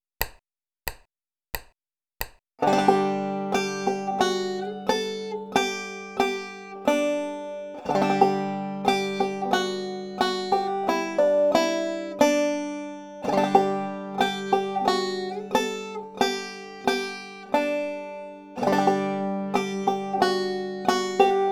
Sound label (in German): barlow knife mit hammer on pull off und roll